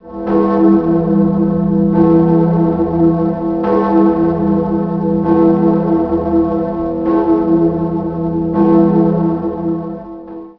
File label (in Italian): campane; maria gloriosa